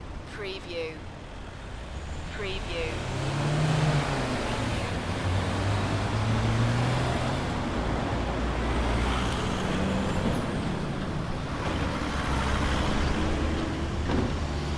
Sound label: Traffic Intersection